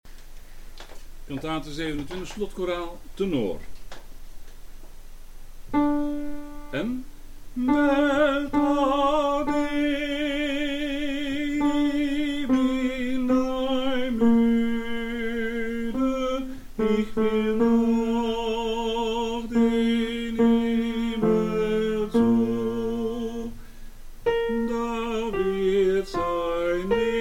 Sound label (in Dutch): Tenor Slotkoraal; BWV 027